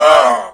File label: pain, male; midnight guns; bootsector male 00